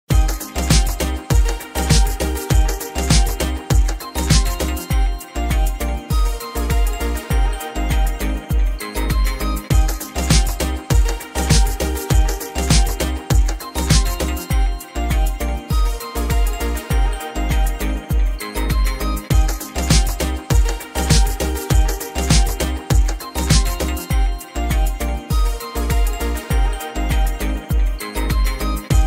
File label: Tropical Beat